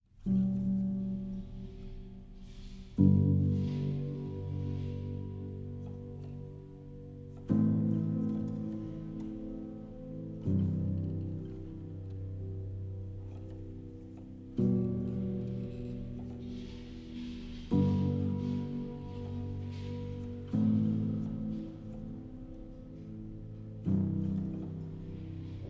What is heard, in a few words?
piano diary